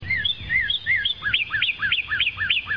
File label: cardinal; birds